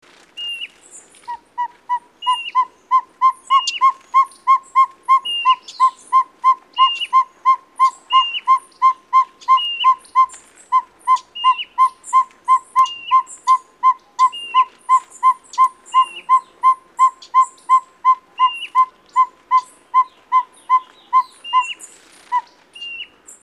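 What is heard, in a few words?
Andean Pygmy-Owl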